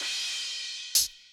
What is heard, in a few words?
Cymbal, Hip Hop